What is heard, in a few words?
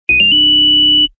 Ring